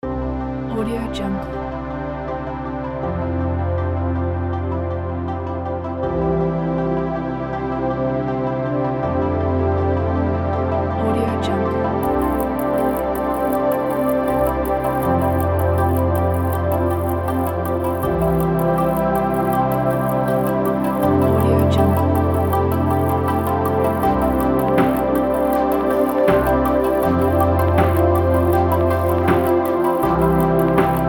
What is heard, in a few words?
documentary